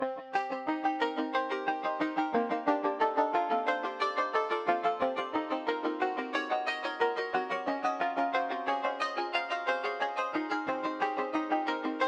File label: Sequence Fx On